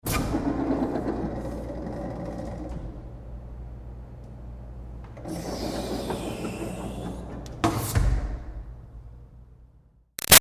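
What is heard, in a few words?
Door Metal Commercial Pull Nice Hinge Open Close; Metal Doors; Big Room Sound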